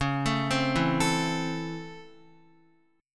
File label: Db Minor Major 7th Sharp 5th Db down Guitar; DbmM7#5 arpeggiated; JGuitar